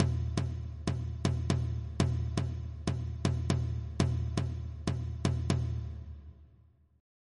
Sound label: rumba clave, rhythm